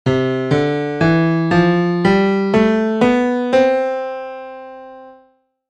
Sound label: Major Scale